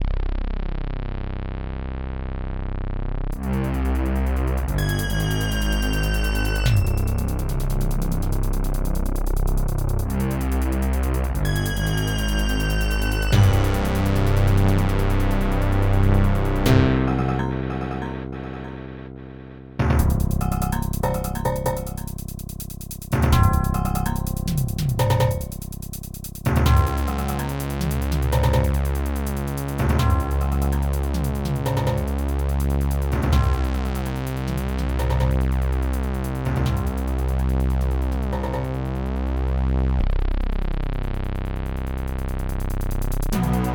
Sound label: Ambient; Dune 2